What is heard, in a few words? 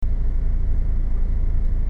car engine loop